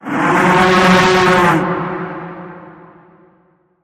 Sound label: Bend, F sharp